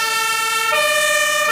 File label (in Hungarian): liebherr, szirena